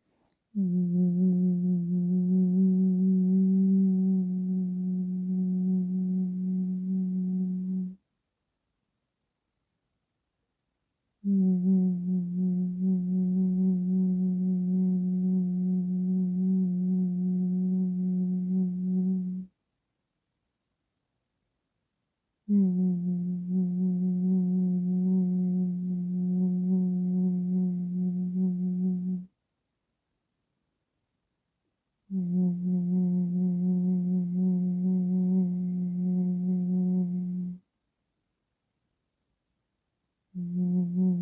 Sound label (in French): Abeille